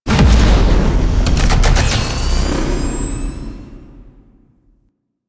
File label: Spawn; Warframe; Veilbreaker; Venkra